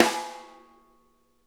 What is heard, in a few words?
SNARE EDG; Sonic Reality; Interactive Drum Kits